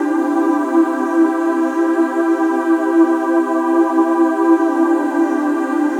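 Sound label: Cavern, ambient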